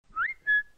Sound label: whistle